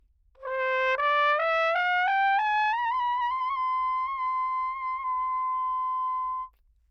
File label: natural baroque trumpet high, Anechoic; OPENAIR